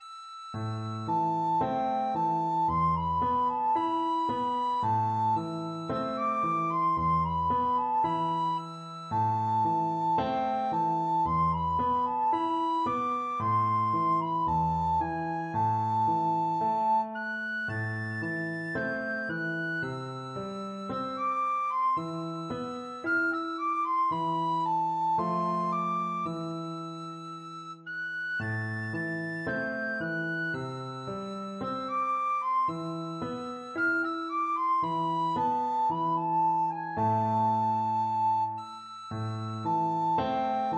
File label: douce dame, recorder